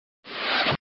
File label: Sound Tone woosh